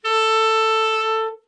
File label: tenor soft, sax; Giga Samples Collection